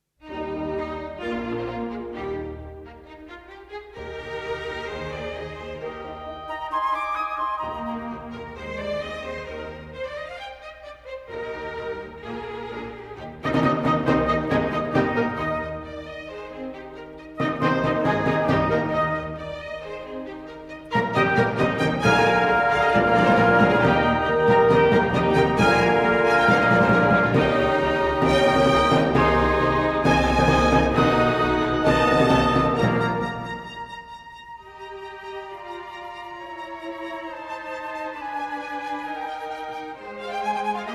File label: Trumpet Concerto in E-Flat Major, Allegro; Haydn; Hob. VIIe1